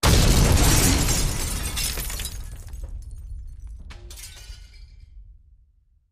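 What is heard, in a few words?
Explosion Glass Debris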